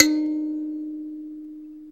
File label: KALIMBA F2; ETHNICSTUFF